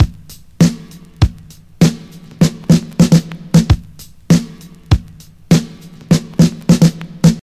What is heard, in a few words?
98 bpm drum loop sample g sharp key